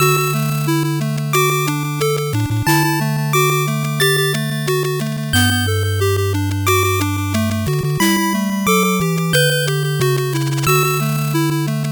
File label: 8bit bgm normal